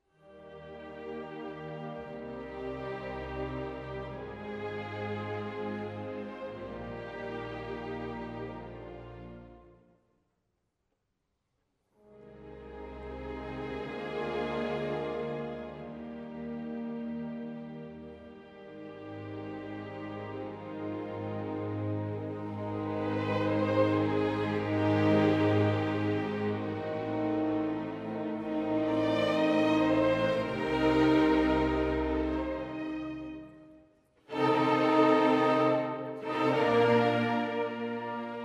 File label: Piano Concerto; Beethoven